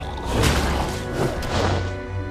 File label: hammer attack; ori and the blind forest